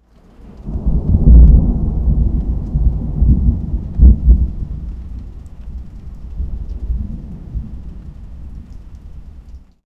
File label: distant low rumble of thu; calming